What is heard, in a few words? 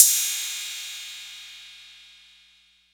RIDE CYM 808, Elec. Percussion; E-MU Producer Series Vol. 2; More Studio Essentials; Composer